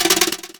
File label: SN ROLL; East West; Global Sounds